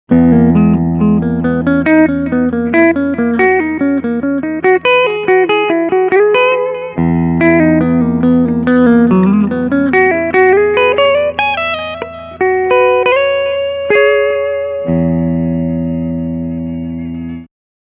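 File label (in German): Picking lydisch beide Pickups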